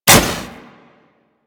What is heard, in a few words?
Boomerang Ignite, weapons